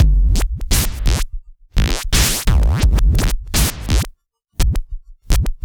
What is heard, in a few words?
Structured Rhythm, IDM Rhythms